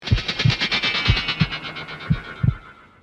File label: malfunction